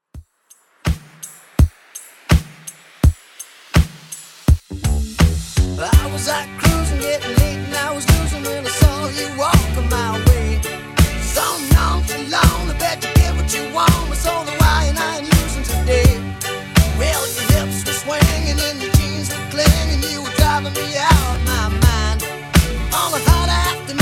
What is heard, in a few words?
70s Redrum 83 bpm